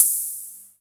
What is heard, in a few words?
Open Hihats, Cymbals; Vengeance Samples